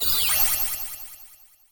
cute pose

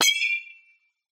metal bat

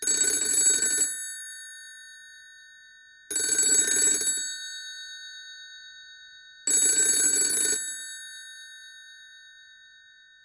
phone black ringtone

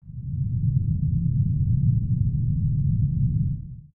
magic quake

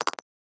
phone cut

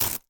saran wrap cut

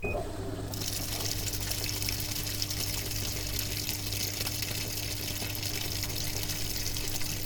washing machine switch

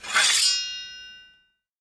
sword drawn

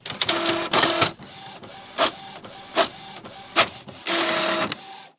printer print

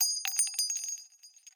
sniperrifle shell

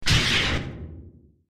robot footstep, machine

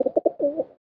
pigeon cry

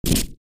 grap, battle

